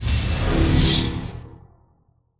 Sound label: shield Recharge; spacemap; shields